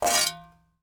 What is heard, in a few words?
Industry Tool Shovel Pick Up Ground